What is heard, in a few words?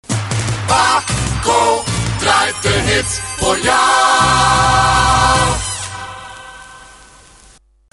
jingle; Bako Lommel